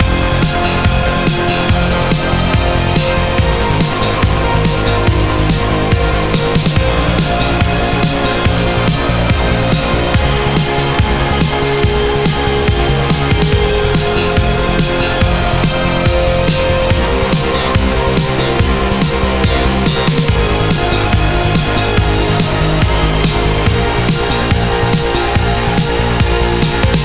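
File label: Speed loop Fury; Duel Maze; Boucle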